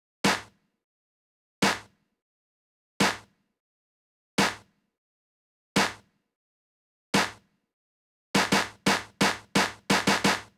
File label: Snare; big fish audio; Hip Hop Exotica; Apple Loops